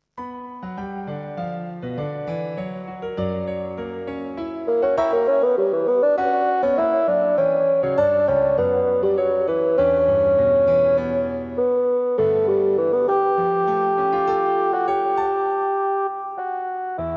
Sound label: Bassoon; Bassoon Solo and Piano